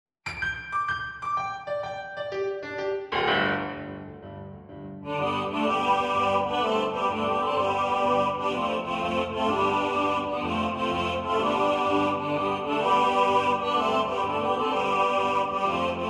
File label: Ode piano vocal; wordsworth